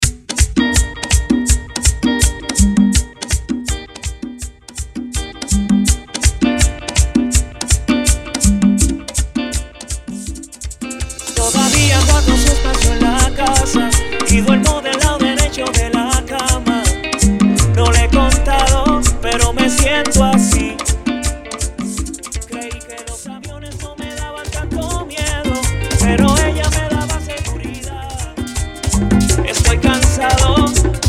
intro outro break 164 BPM

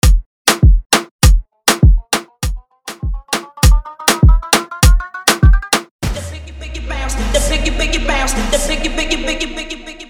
afro shatta 100bpm

